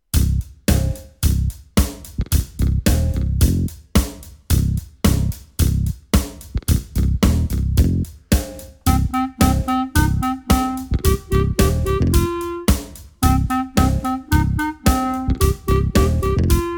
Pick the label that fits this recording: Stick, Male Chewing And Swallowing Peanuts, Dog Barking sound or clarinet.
clarinet